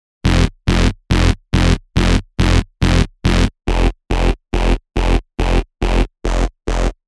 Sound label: Reversed, hard techno; revealed sylenth1 hard techno vol 1